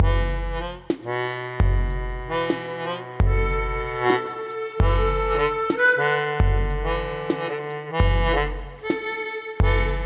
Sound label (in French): Harmonicas La marche des notes